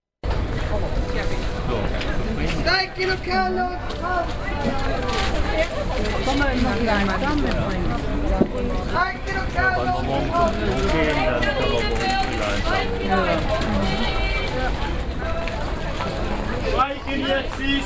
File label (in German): markt mittelgang